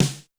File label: Snare, Drums, Roland JV 1080